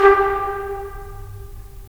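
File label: brass trumpets staccato, right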